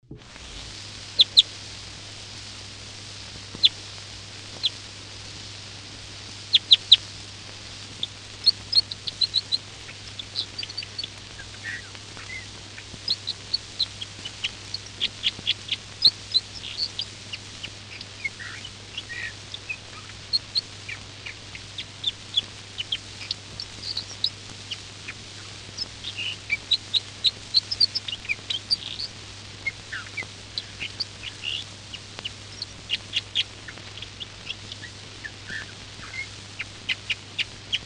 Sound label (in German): Fichtenkreuzschnabel; Vogel